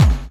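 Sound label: snare drum bass drum, Dance Mega Drums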